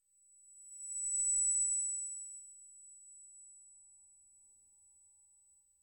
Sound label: a tiny crystal shard bein